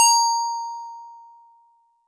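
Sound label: Metal Tube DX100 A sharp 6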